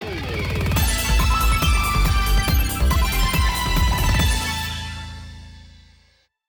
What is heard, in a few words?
Jingle Battle End; Megatagmension Blanc + Neptune vs Zombies; ps vita